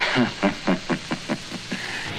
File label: laugh kung fu; ooh ahh